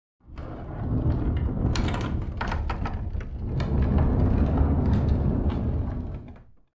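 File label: Dresser Rolled On Wood, scrapes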